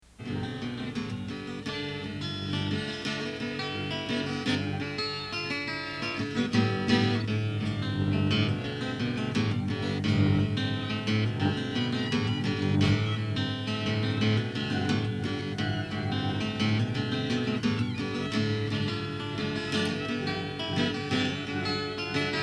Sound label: Organ jam